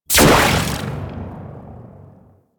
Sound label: Bow Shot, Weapons; Warframe; Corpus